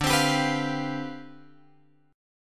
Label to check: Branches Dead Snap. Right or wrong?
Wrong